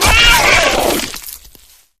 flesh destroyed large; flood

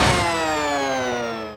minigun spindown; weapons

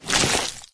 human form short move; flood

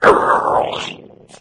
leap, flood

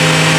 saw cut loop, circular saw; weapons

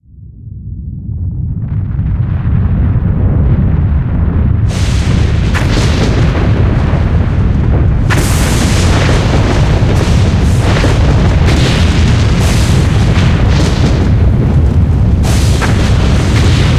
blowout, ambientnoises background; paradoxrp; armagedets

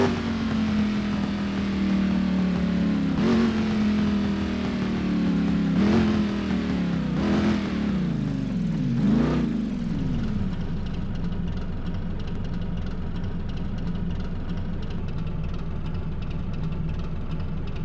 throttle off, vehicles; sgmcars

